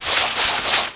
clean

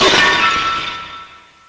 shatter

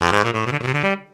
bigband saxophone transition

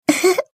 laugh

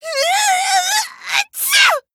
sneeze; toko; drae

